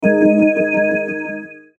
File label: CHIME WARBLE, Chime Warble Melody; Chime Warble Melody Production Element Imaging Element Accent Transition; Multimedia; Blastwave